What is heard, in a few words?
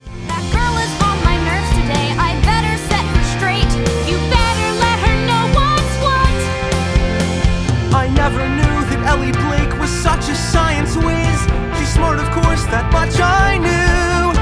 Vocals